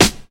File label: Clear Steel Snare Drum F# Key, snare one shot tuned to the F# note